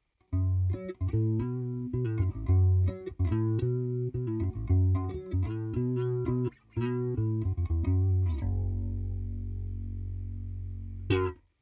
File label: BASS; DComp is a digital re-creation of a classic guitar compressor pedal